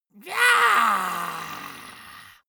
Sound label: monster growl high; schreie